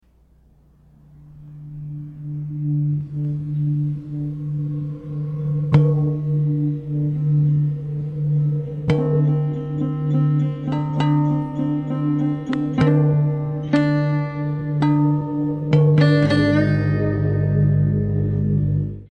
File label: sarod